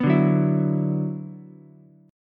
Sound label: D 6th Add 9th D, Ukulele, C6; D6add9 strummed; 4,2,2,7; JGuitar